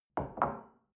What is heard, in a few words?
shell place on table soft, gastronomie; berufe; soundpool